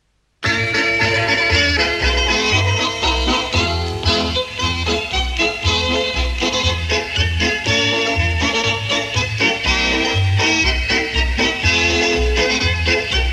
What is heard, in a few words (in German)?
Jingle Bells langsam